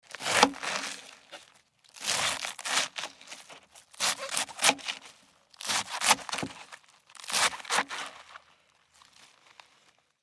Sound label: Slicing Cabbage